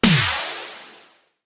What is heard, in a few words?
start; astro Legends Lyra and Erion Desktop; Foxium